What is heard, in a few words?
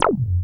wasp sting; Masterbits; Vintage Voltage; SEQ-FX